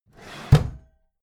empty drawer smooth open heavy

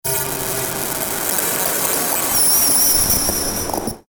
layer distorted hf

